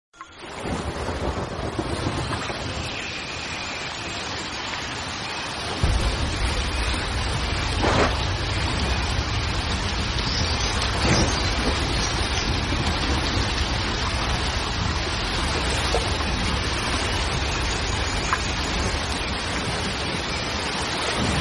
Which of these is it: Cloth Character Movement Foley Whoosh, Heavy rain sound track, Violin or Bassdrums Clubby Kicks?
Heavy rain sound track